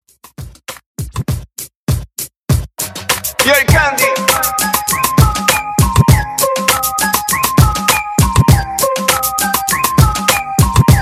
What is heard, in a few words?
Club Latino Redrum 100 BPM; Clean Only